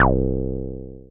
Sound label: Synths BASS